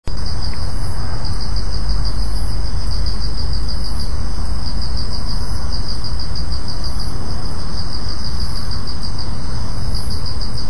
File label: night